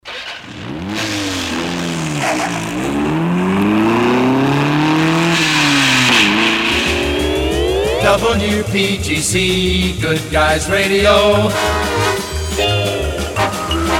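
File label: jingles jet set sports car sound effects; pams; series 27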